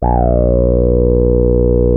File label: TAURUS C3, synthesizer